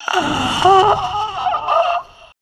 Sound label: female zombie idle; nmrih; zerosiki; slender